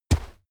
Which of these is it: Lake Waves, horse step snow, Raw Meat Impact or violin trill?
horse step snow